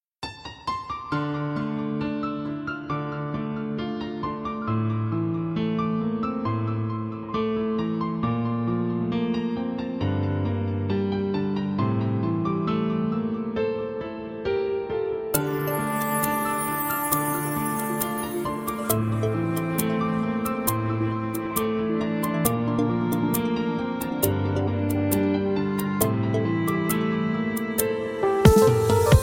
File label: Melody Piano